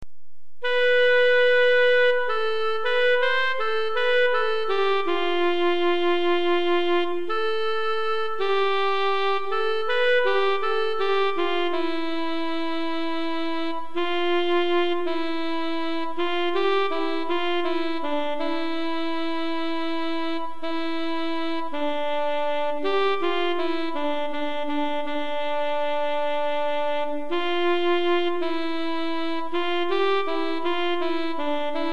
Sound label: chechen folk music